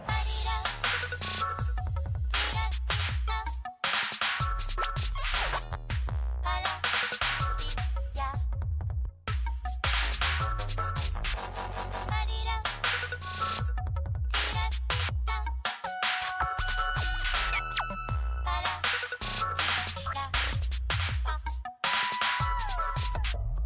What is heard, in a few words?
Dub and Bass